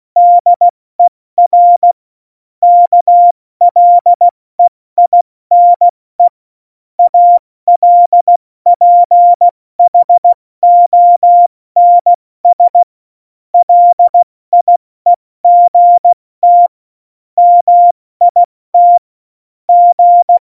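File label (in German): Witz, cw morsen; Morsekurs; Witze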